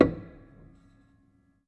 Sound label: Harm High, Piano Harmonics